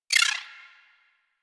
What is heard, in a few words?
Dex Sybaris Tech C; Warframe; Weapons; Tenno